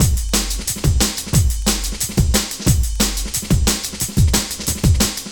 Cool Beat, Drum & Bass; Fluid Dynamics

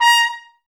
BRS, Woodwind Brass Ensemble; Miroslav Vitous; Brass Finale

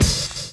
DRUM CHOP; AMG Gota Yashiki Groove Activator

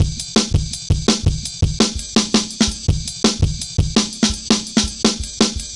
Jazz Ride A, Drum Loops, 166.5; Zero-G Jungle Warfare 1, 2 & 3; 90 s Sample CDs

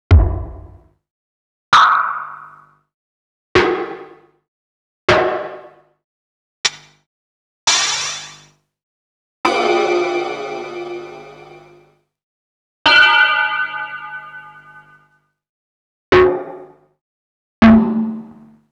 breaks, Jungle; Zero-G Jungle Frenzy 2

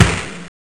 Snare, Genesis; M8

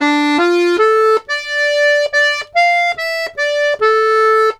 Accordions; USB Soundscan vol.40; AKAI

